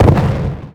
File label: firework